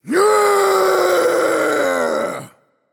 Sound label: battle cry; Smite; camazotz